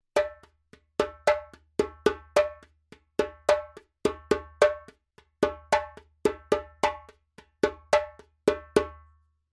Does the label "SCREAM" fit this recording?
No